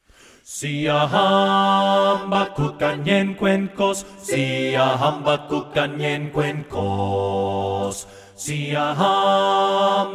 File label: siyahamba (bass)